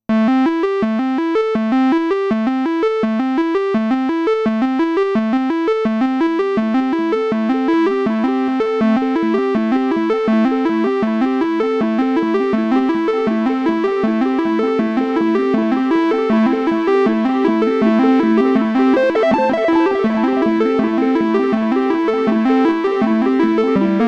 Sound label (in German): Sinus mit Delay